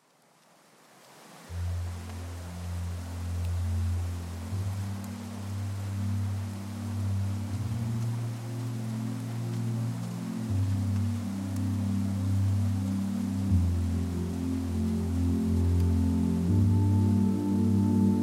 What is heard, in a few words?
Electric Dreams in Liquid Rain